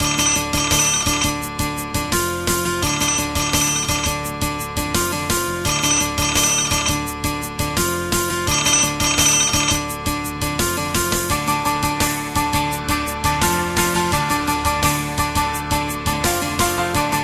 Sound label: ringtone; there is no phone; openmoko sound theme standard